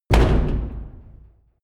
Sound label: large damper